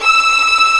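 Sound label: Violin Tremolo E5; Multisamples; Digital Sound Factory Virtuoso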